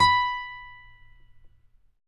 Finger B 5 R, Guitars; Spectrasonics; Hans Zimmer Guitars VOL-1

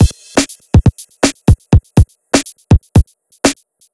BEAT, Hip-Hop & R&B; USB Soundscan vol.47; AKAI

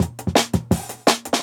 Gasp; 90s Sample CDs; Original Jungle Breaks

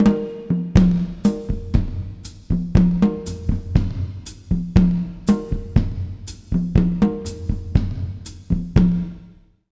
120 W side, jazz latin drumloops

